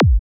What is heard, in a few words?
Kick G; Parandroid